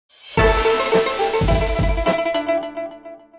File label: win; plentydragons